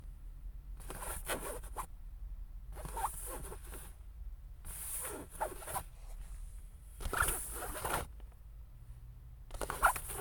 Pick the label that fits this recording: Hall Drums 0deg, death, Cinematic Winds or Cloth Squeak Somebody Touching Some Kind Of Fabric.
Cloth Squeak Somebody Touching Some Kind Of Fabric